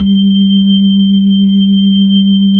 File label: B-3, Hollow Ch; Roland LCDP10 Keys of the 60s and 70s 2